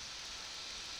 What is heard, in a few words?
Background Noise